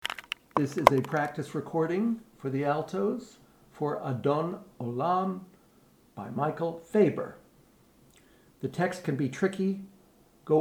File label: alto; Adon Olam